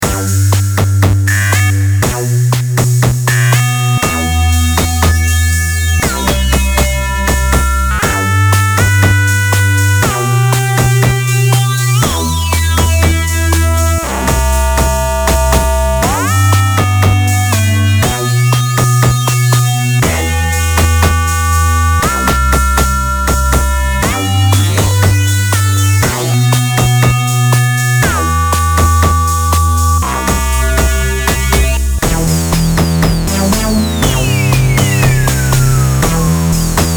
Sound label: noisemaker, Roland